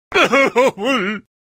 king cry emote; clash royale; Voicemod

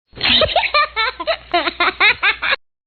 baby laughing; Laugh Soundboard

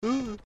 ooh

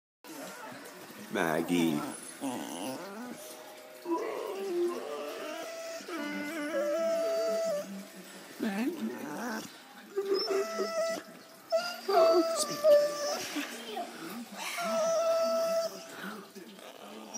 Autotune Dog Meme

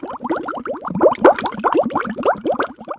bubble sound effect

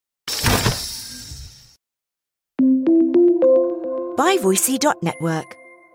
door opening; Among Us

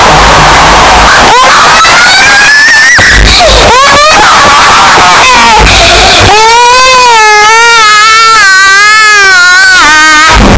Boys Screaming And Yelling Earrape

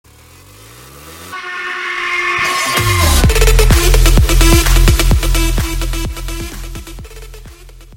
rage dubstep fade

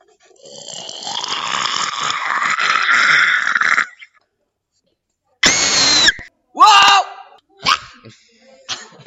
scream eagles

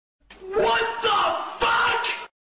wtf sound effect

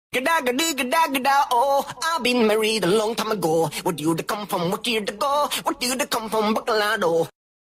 Chicken Nugget Singing; gedagedigagadao

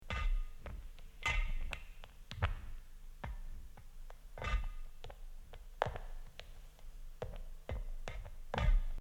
metallic fragments

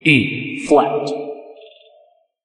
Vocali, e flat voice sound effect

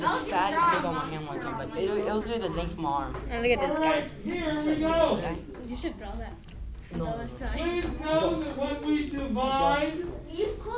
ehehhehe

